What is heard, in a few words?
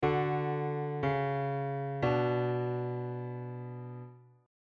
Passing Tones